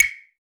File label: Mokugyo; Roland BK-5; Percussion